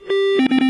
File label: autodarts triple sound